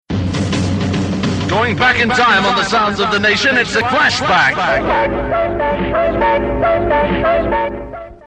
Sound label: Going Back In Time jingle; Flashback; MAR Jack